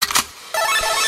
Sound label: click; Euphoria